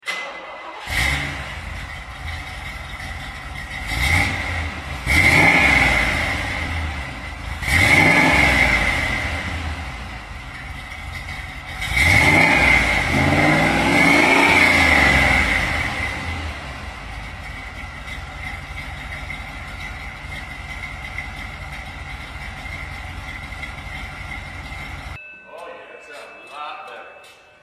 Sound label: BatVette 350ci dual Xpipe, custom exhaust systems